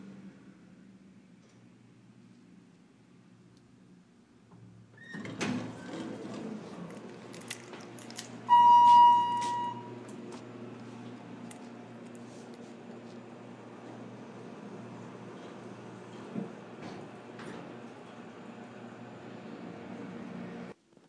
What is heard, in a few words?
an everyday sound when isolated can be scary